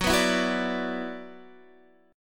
F sharp 7th Suspended 4th Sharp 5th, Guitar, DADGAD